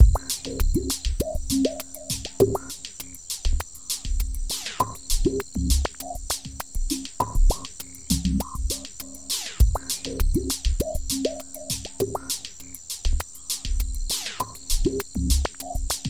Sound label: Background Loop; Jackbox Games; The Jackbox Party Pack 1; LIE SWATTER